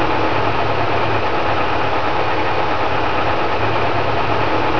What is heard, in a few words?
hev idle; netpanzer